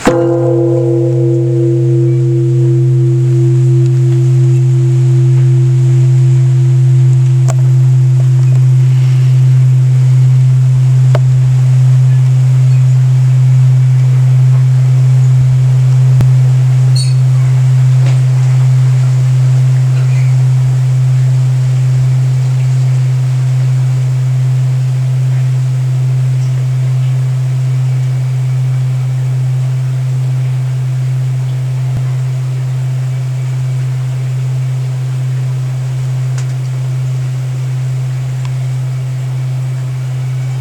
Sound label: Daihikaku Senkoji Bell